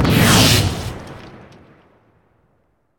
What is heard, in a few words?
wire bomb; Original TK Sounds